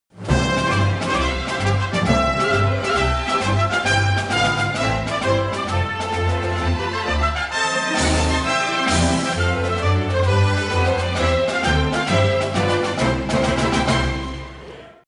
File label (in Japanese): スポーツ中継テーマ; NHK